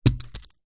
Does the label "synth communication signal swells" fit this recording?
No